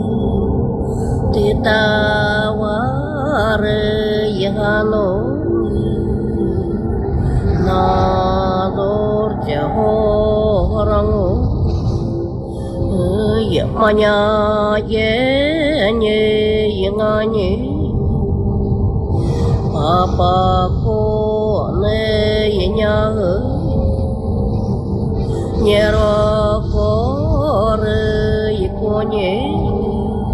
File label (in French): Chants Nenetses